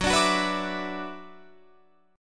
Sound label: Gb 7th Suspended 4th Sharp 5th Gb Guitar Open F, Gb7sus4#5 Chord; Gb7sus4#5 strummed